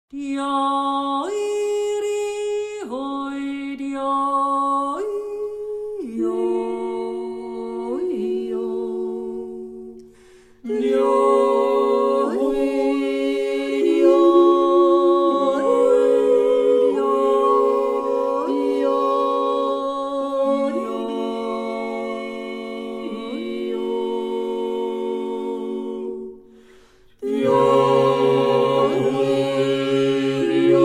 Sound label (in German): Wurzhorner